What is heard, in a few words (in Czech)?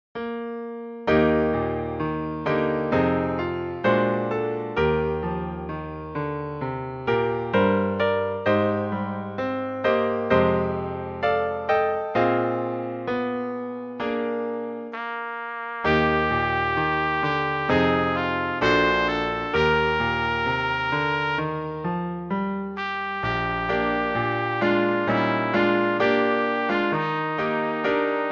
trubka; Přednesová skladba pro trubku; Romance; T. P. Westendorf